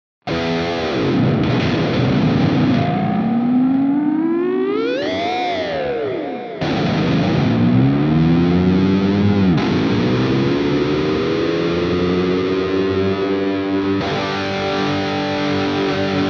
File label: Jackson PRO PLUS Solist SLA3 High Gain Floyd